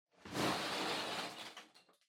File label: Loose Fill Packaging Far Take Out Contents; 梱包材